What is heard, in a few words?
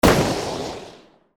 shot